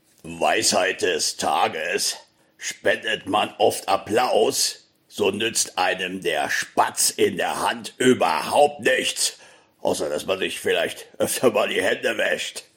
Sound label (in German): Applaus; Weisheit d.T.